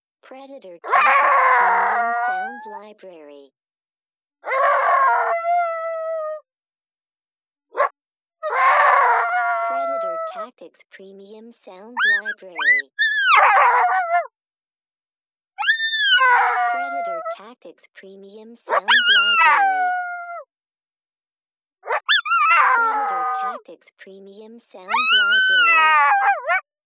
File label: HOWLIN